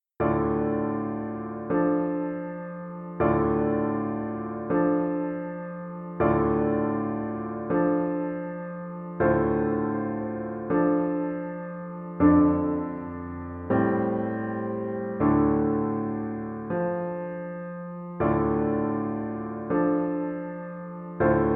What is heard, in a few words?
Song without words Euphonium